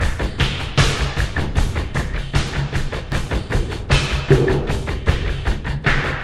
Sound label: Lake beat